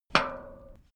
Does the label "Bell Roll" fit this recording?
No